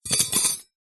household cutlery handful set down on soft surface; zapsplat